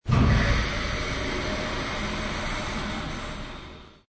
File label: Release Valve; Gates; Doors